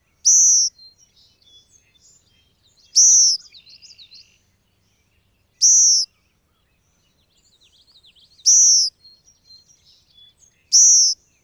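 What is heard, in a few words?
Song Sparrow fledgling zeee calls; NY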